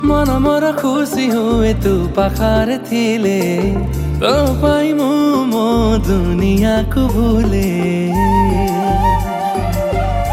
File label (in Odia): New Romantic